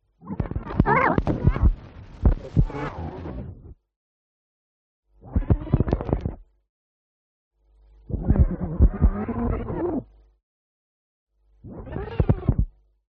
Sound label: tape recorder reel crackly